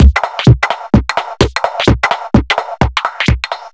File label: Beat, 128bpm, uk garage